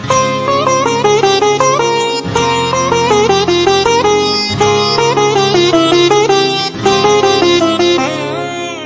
Sitar

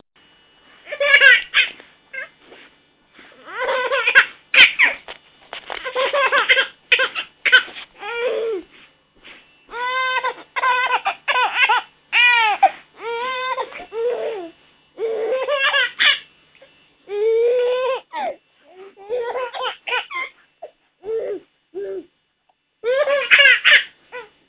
Baby Laughter

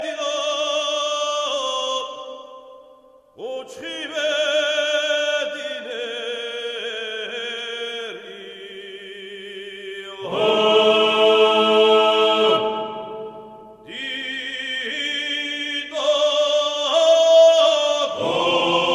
Georgian megrelian

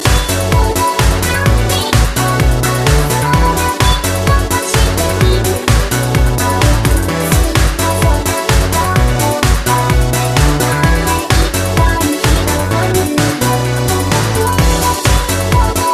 Chip

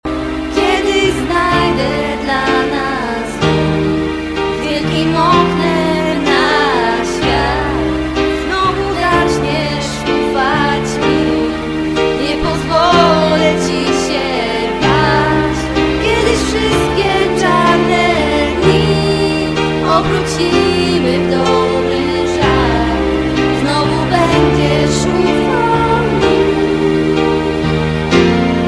Polish Song

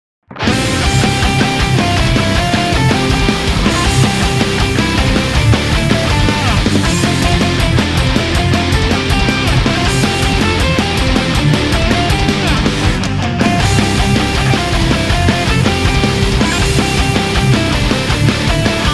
Metal; Telegram

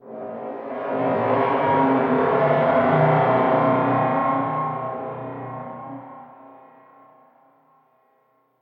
Growl

